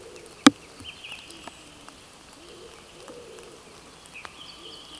Columba palumbus

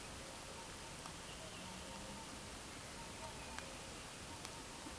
Cygnus cygnus

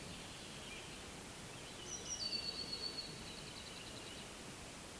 Parus caeruleus

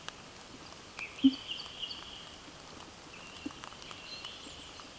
Anthus trivialis

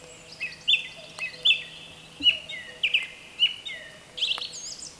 Turdus philomelos

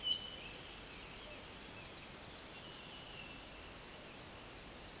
Regulus regulus

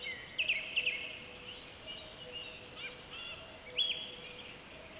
Haematopus ostralegus

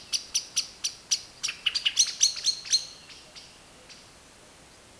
Turdus pilaris

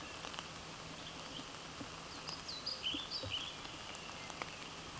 Ficedula hypoleuca

